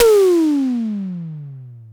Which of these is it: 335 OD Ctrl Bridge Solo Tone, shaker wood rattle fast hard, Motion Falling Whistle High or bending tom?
bending tom